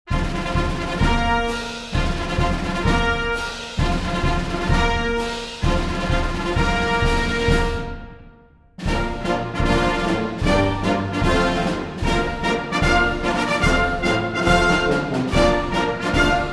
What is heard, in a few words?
FOUR RUFFLES AND FLOURISHES AND GENERAL'S MARCH